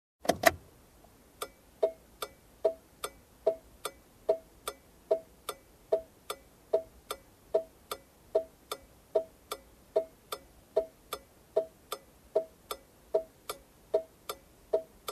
Car blinker

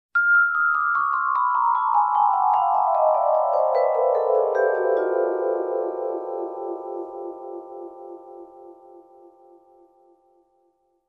Vibraphone arpeggio scale down

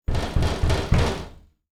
Banging on door

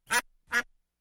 Duck simultaneous quacking

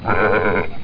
Lamb bleat

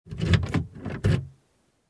Locking Car with key

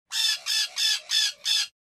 Fowl clucking

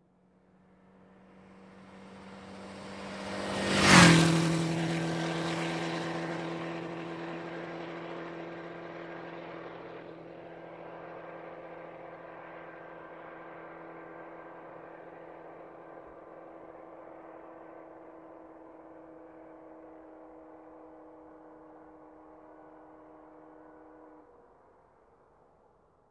Motorcycle passing by fast

